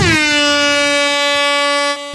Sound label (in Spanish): Sirena